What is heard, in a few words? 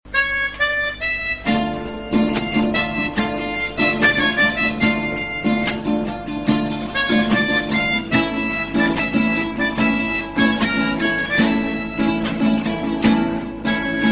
romantic harmonic ringtone; i phone 6 s